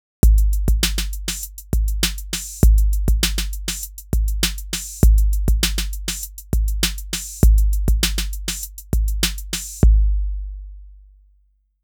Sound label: tape 808 custom combination; Reason Redrum; goldbaby; 808 shootout